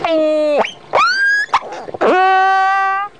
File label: orca; VoceVista